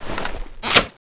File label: speargun draw; weapons; speargunm